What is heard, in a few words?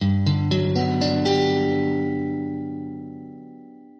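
Gmaj, Guitar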